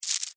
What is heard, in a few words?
Spider Web Shoot, Spider, Web, Shoot, Short, Arachnid, Insect, Bug